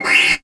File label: SLIDE, CELLO FINGER; Xsample VOL-5